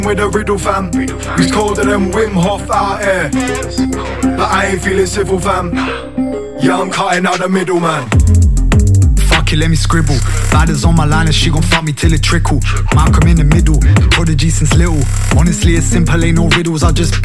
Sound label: drum middle